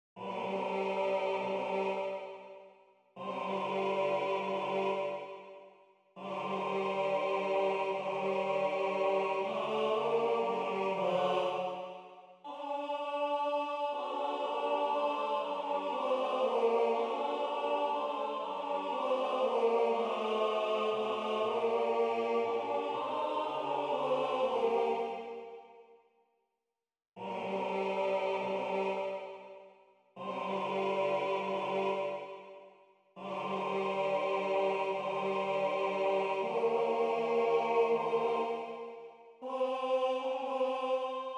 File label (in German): Gloria mit Soli Tenor